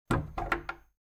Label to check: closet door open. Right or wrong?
Right